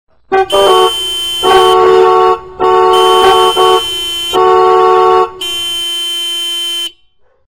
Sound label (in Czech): klaksony